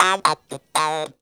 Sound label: Funky Vocals; USB Soundscan vol.18; AKAI